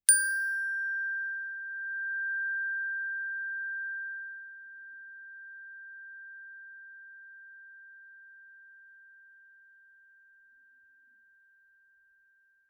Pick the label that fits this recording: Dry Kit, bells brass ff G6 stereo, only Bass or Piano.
bells brass ff G6 stereo